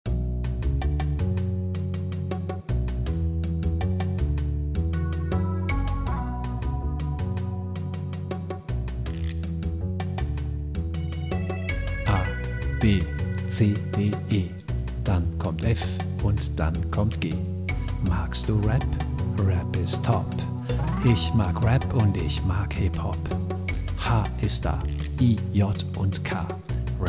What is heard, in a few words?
Rap